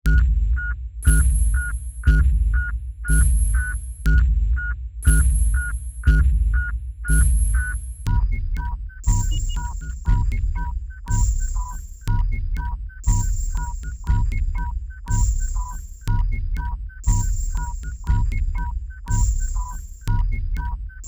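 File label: sine wave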